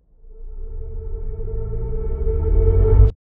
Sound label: Riser